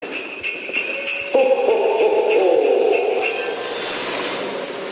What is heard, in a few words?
gold siren